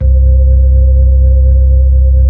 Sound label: B-3, Brite; Keyboards of The 60's and 70's